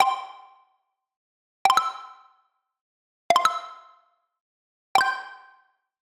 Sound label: Digital Click Positive